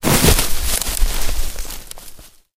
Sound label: haystack impact; tanks; War Thunder